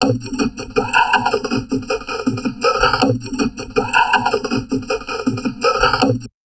TALK, Drum Loops Crazy Processed

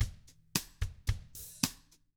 COUNTRY, Drums; 111COUNTRY

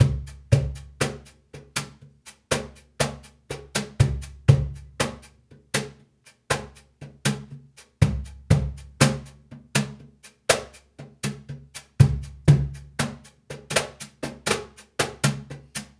Cajun, Percussion, Drums; Essential Percussion

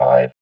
VOX VOX, Electro Vox

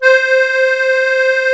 HOHNER, akkordeon

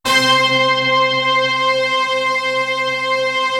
orchestra; East West; Global Sounds

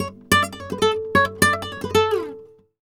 gta a, Flamenco, Guitar, Picado

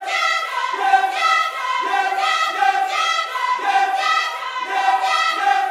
CIR YES, Vocal Gospel CIRCLE LPS

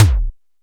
E.K, ANALOG; Roland MV8800; ANALOG CRAZE

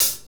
HAT, Roland; Northstar - Drumscapes Roland

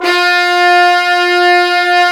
BRS, Fat Pop Sect; Roland L-CD702; Fat Section

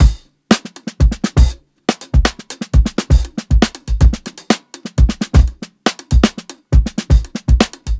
60 BEAT, DRUMS LOOPS; BASEMENT DRUMS VOL 2

